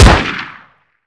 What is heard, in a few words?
pistol fire; weapons; pistol zm